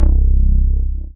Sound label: dance bass; Sound & Vision - Gigapack II CD 1